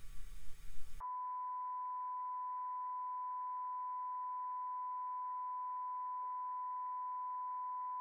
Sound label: Sin calib rec; Alesis inside